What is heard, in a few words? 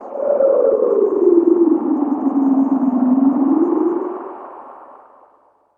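deep house FX, 125 bpm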